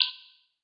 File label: CLICK